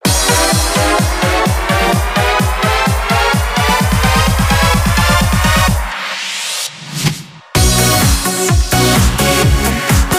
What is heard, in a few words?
License Gold; Gran Turismo 6